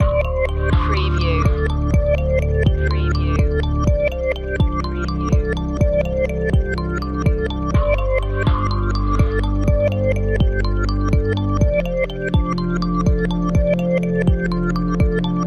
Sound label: future waves loop